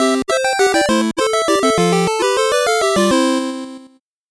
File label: 8bit jingle normal